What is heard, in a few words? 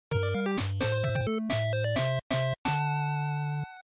GB Sports Start